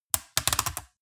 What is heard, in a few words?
metal device rattle click; industrie-wirtschaft